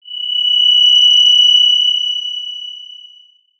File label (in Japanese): キーン